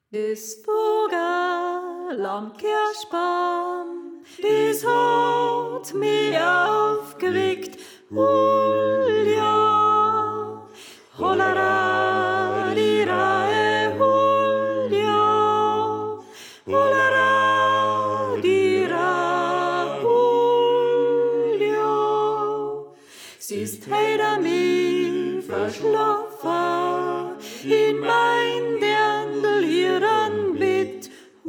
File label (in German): Sopran; Des Vogerl Am Kerschbaam